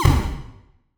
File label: Retro Gun Laser Single Shot; Weapon